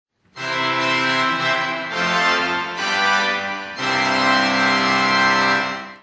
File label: 3 reeds, organ; organ music programmes